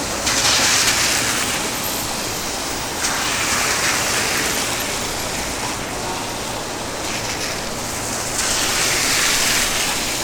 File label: car rain, street traffic; best service Blue Box